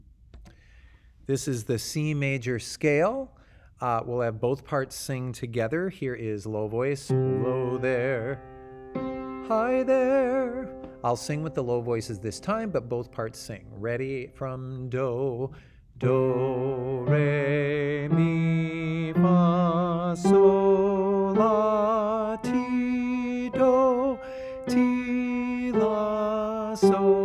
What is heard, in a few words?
C Major Scale, choir